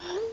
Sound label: iphone text short